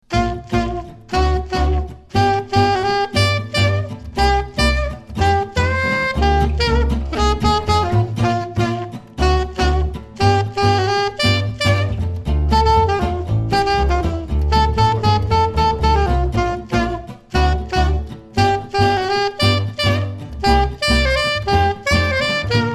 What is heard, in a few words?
Charleston